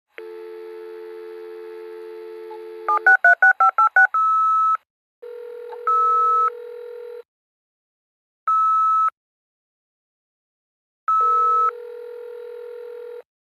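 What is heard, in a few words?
computer modem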